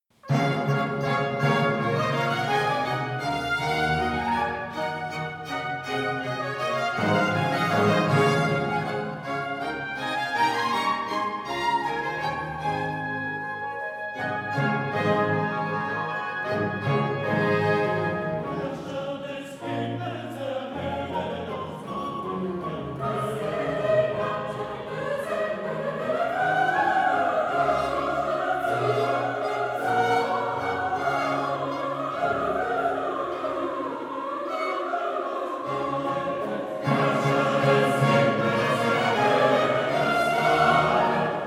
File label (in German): Weihnachtsoratorium Chor; Weihnachtsoratorium III; Herrscher des Himmels